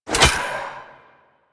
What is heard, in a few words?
Spear Reload, Weapons; Warframe; Tenno; Priest Spear